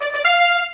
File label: prestige